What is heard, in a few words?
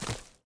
med creature fs dirt, footsteps